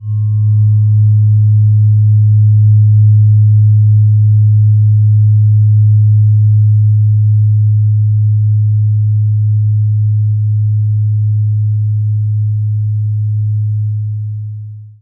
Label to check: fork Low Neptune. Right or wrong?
Right